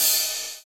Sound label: Ride; Equipped Music; Loungin House